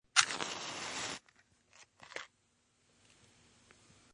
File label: Stick Match, Single stick match, lights; Flames; Objects